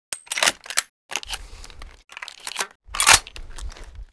saw; Mafie 1

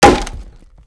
wood; Mafie 1

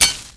bush; Mafie 1

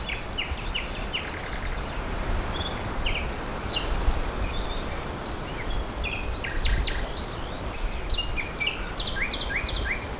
ambi; Mafie 1

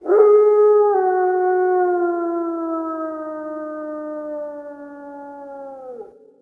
out wolf; Mafie 1

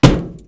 car; Mafie 1

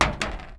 metal; Mafie 1

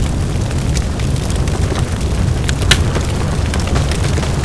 fire; Mafie 1

